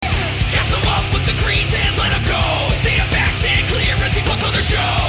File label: Game Tune